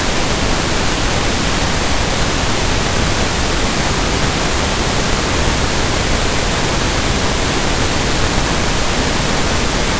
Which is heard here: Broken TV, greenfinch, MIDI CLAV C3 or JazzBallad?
Broken TV